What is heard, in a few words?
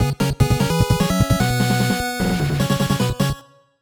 Scene Clear; Teenage Mutant Ninja Turtles II The Arcade Game